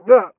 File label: m pain; Groks Body Health System Redux